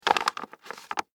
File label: Office Envelope Manila Handle Crumple Movement